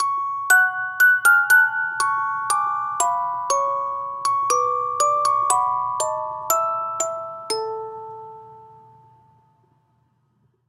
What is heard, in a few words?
Music Box; Assassin's Creed Syndicate